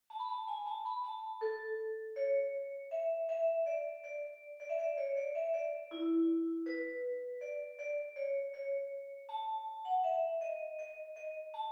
menuet quintette cordes; boccherini luigi

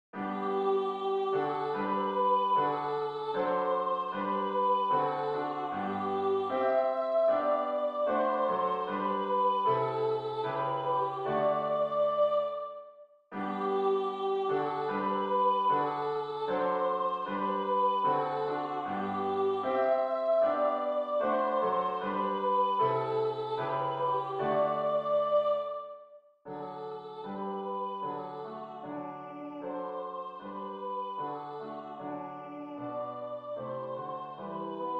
hymne national allemand; haydn joseph

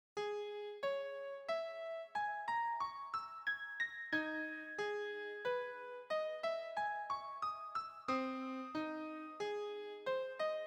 reve la bemol mineur

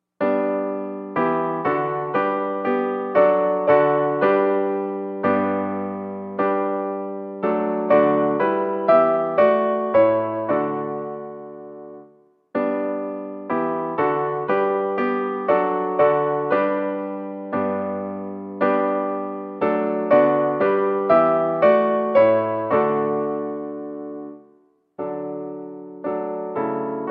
hymnes et cantiques piano

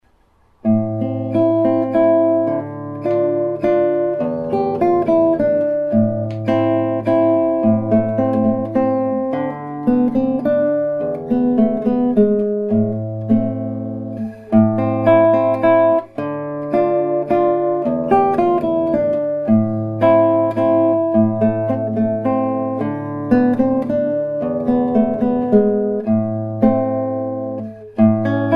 valsette

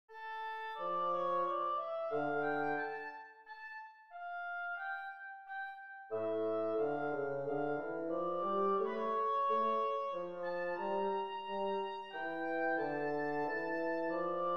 menuet mineur; lully jean baptiste

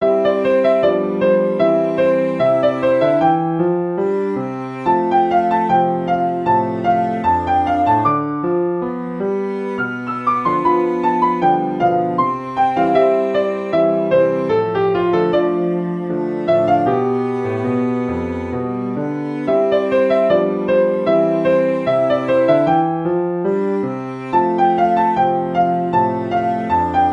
petite fantaisie pour piano et violoncelle

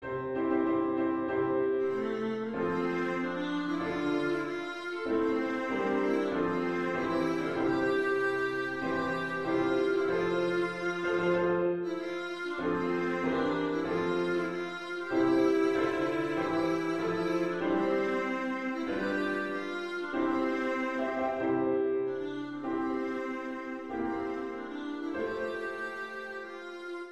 hymne national de Belgique; van campenhout francois

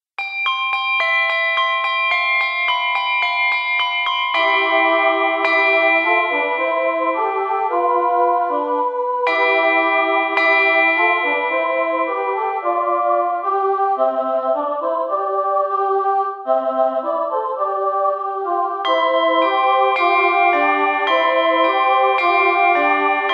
carillon de noel

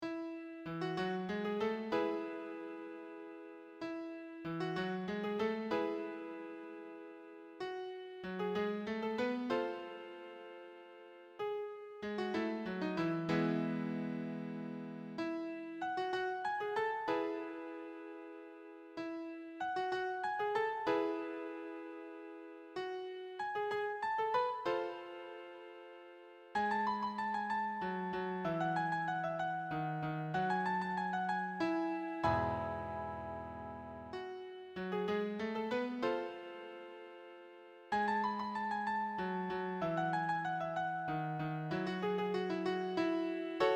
valse en mi majeur

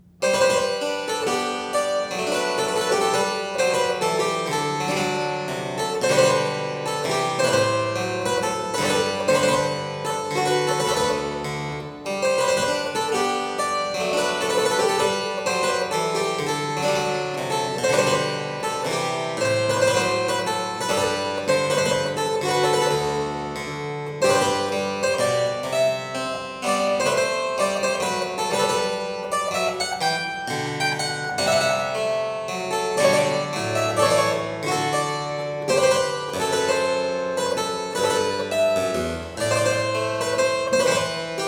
menuet; leba gue nicolas

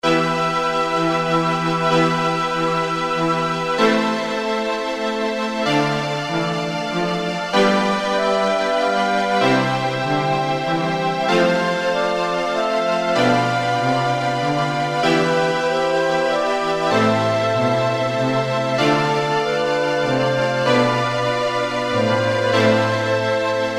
concerto pour flute orchestre cordes

